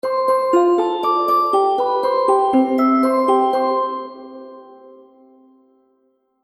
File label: Happy Baby Game Music; Cartoon Music